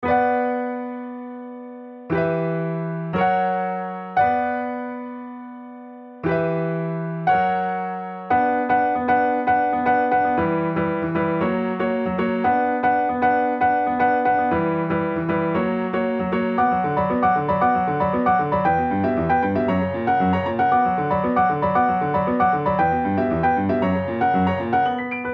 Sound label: KEY SOLO